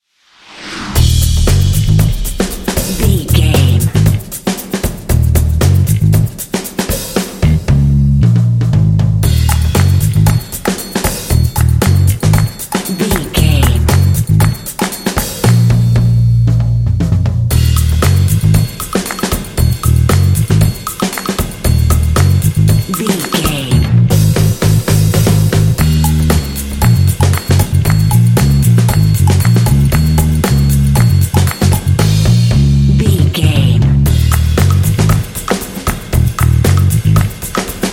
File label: hip funky drums claps percussion ad with bass line